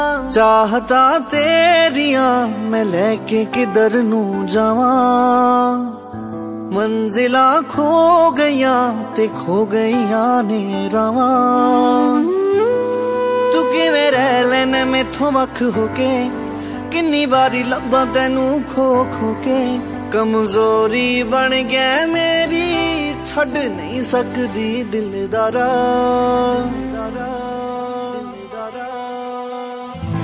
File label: Punjabi Sad Song Ringtone